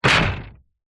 impacts metal crunchy hit sweetener

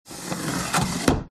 close window; fox audio

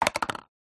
household cup plastic empty knock over table; zapsplat

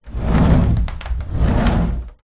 household cupboard door wooden sliding

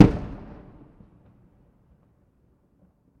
explosion small; sound effects one

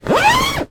foley bag holdal polyester zipper fast; zapsplat

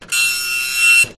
Vintage Dot Matrix Printer Long Burst; Skyes Audio

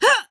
Female Grunt Shout

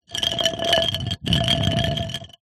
foley wood chair leg roll on hard ground; zapsplat

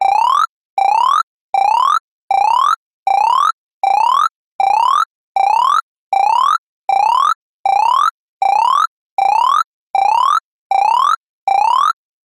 science fiction console computer alarm ring loop; zapsplat

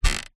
household mattress sit on squeak

